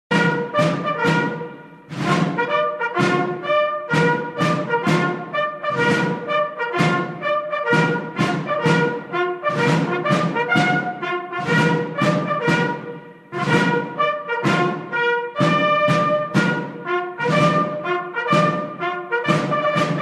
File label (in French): musique ringtone